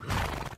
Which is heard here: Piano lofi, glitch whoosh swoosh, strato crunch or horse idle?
horse idle